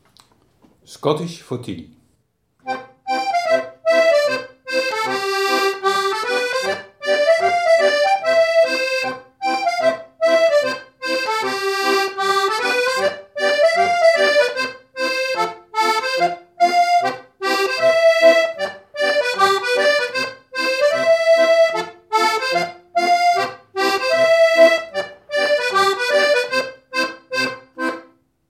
schottish; schottish voor tiny

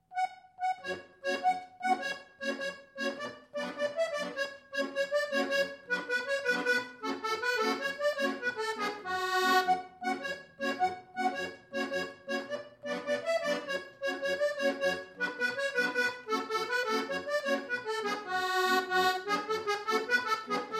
Tarantella Napolitana

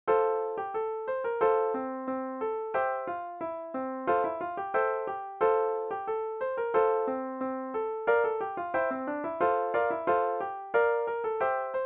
Polka F; Cottingham Polka

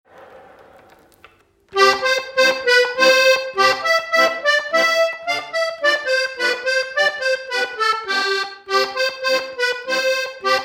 mazurka

